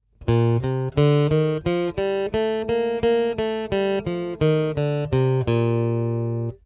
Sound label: Bb Major Scale, guitar